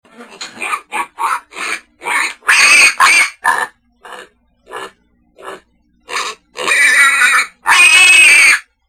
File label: Pig; ORION